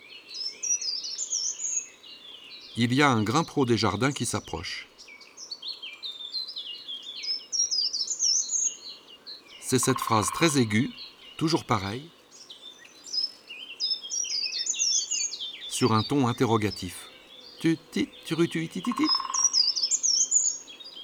Grimpereau des jardins; ABC des chants d oiseaux

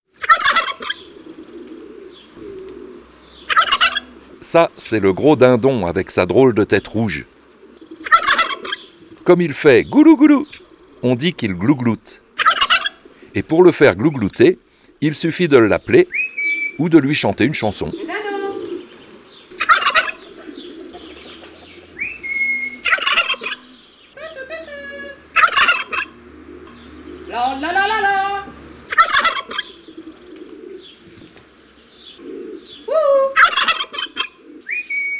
Le dindon

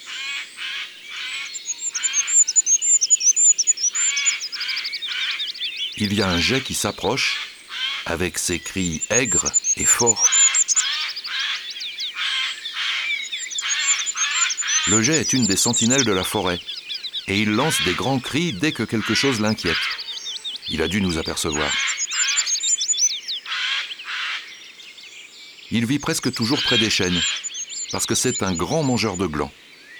Geai des chenes; ABC de la nature

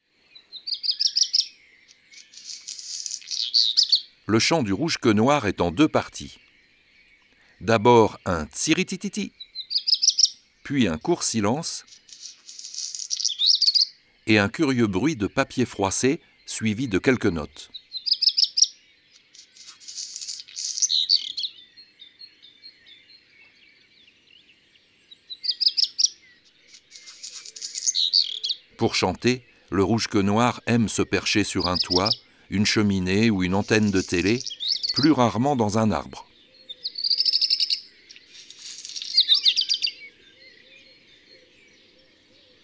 Rougequeue noir, oiseaux des jardins

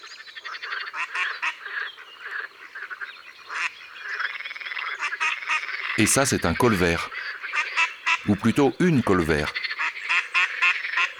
Canard colvert; abc de la nature

